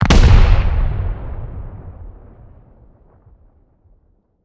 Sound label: satchel charge explosion; Rust